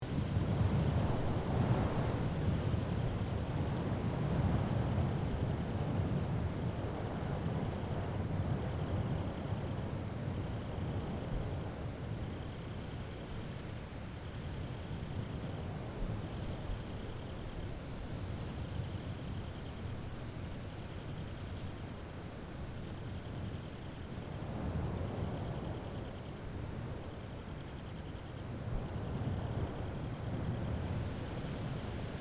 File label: Quiet desert night ambience loop